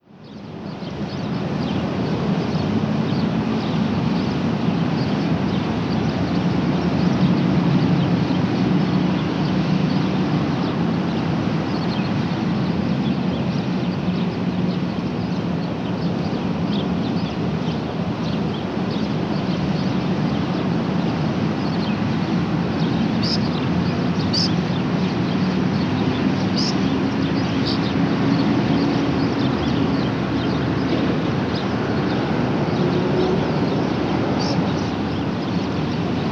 Suburban Highway With Birds

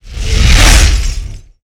Crashing whoosh

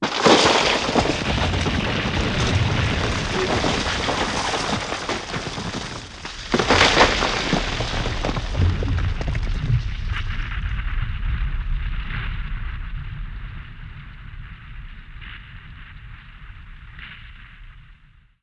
Landslide With Rocks

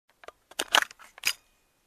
Removing Camera Lens Shade

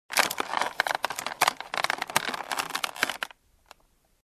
Moving on Creaking Material

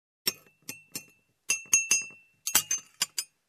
Glasses and Dishes clinking

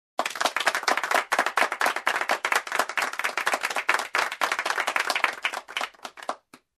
Close Room Applause

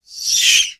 Bat movement shriek

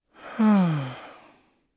Male Sigh

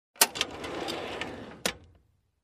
Closing small drawer

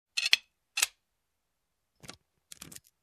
Locking Up

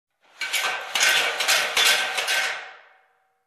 Industrial metal rattling sound in hall

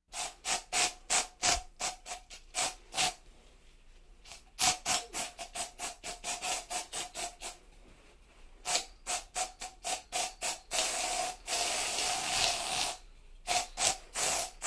Sucking through empty glass with straw

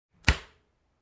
Cutting Leek

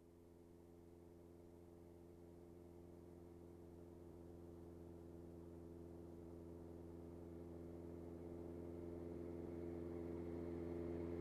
Motorcycle approaching and parking

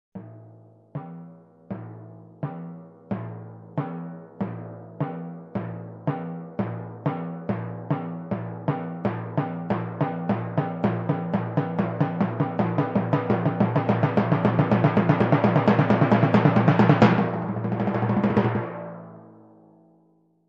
Long timpani tension buildup

